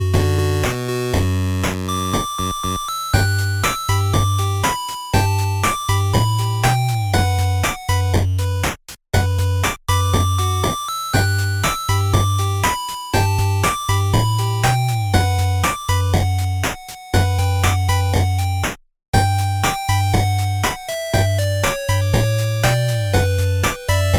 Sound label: 8bit